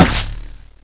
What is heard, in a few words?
Bow